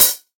smooth hat one shot b key, Royality free hat tuned to the B note. Loudest frequency: 10265Hz; hat samples